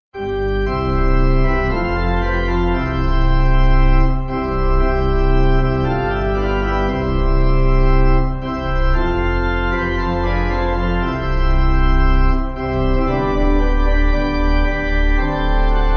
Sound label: Pipe